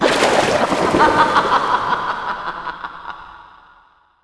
Water skill; EmuClient

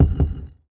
Coffin

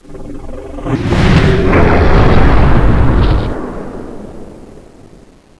Dragon die; Seer; GamigoNA

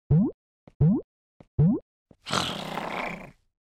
Dragon Baby Action; Seer; GamigoNA

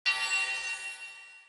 Item Get

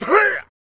Shadow DMG

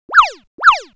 Bunny Action

